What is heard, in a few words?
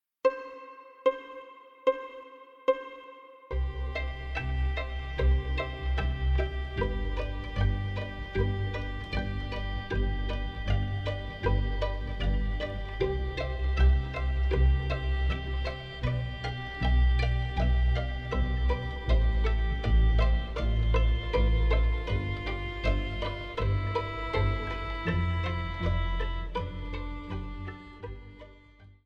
piano